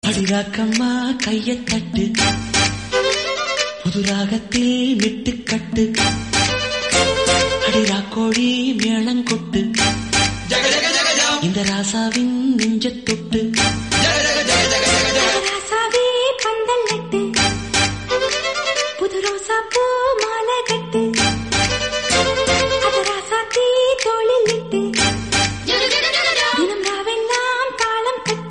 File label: Tamil Song; Thalapathi